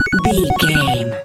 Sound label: retro beeps success